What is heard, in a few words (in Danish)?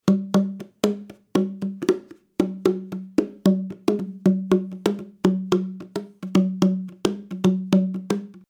bongotromme sonor CB 78; BBHG sunburst; bongotromme i roed